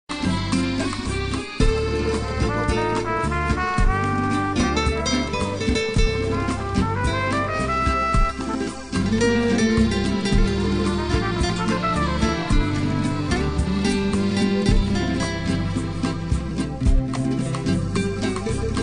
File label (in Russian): Klassicheskii Dzhaz; Jazz